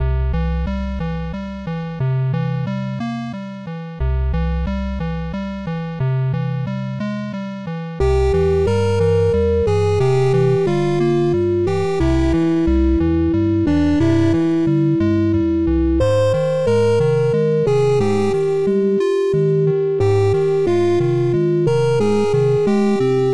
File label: music meadows